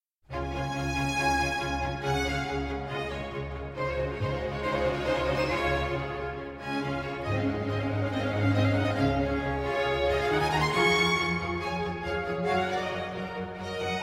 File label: String Divertimento KV 136 D Major Allegro